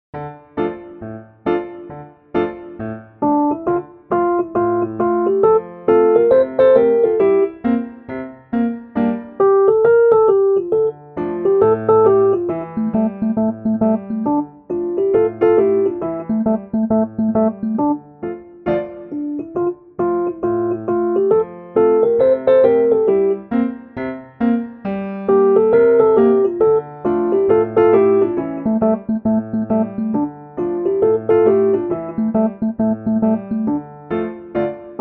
instrumental et melodie

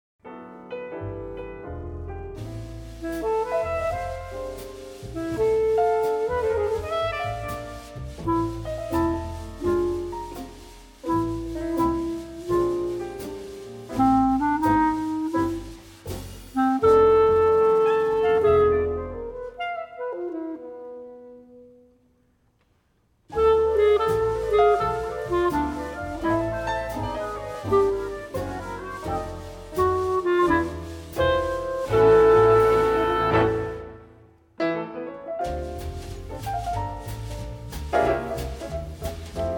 clarinette

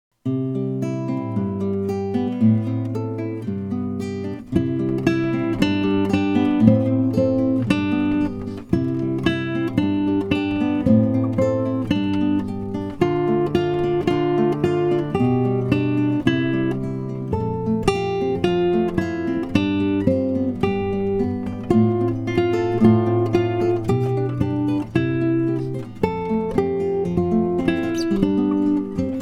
accompagnement guide

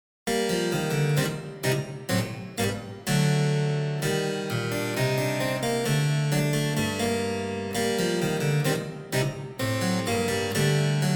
clavecin; celle qui fait tout mon tourment airs serieux et boire h450; chantons baroque

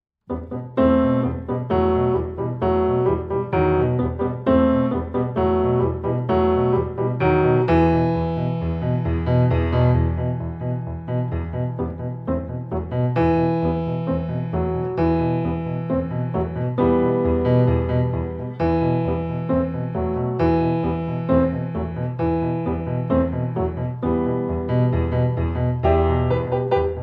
instrumentale

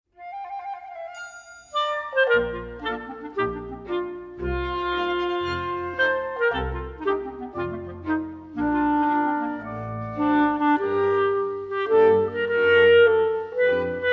instrumental melodie

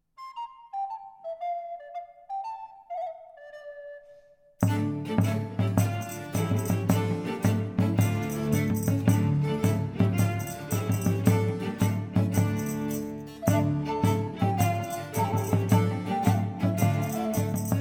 baroque percussions; amants malheureux; Alcione

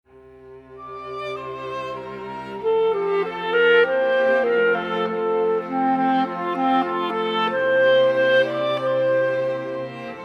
instrumental melodie

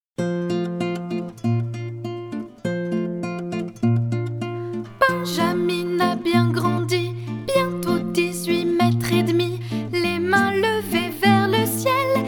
comptine

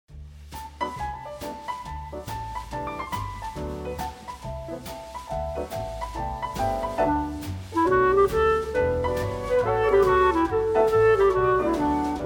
clarinette

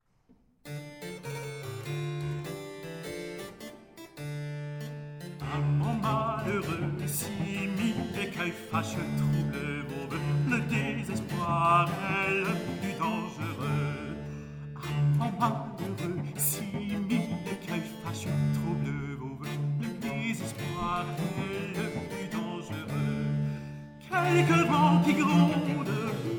baroque tenor; amants malheureux; Alcione

